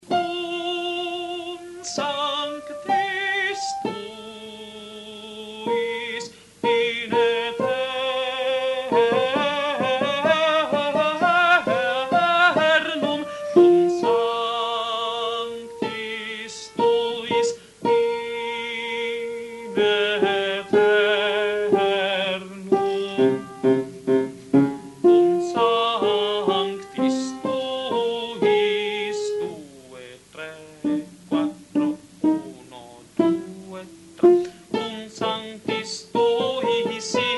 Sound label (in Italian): Agnus Dei soprani; Mozart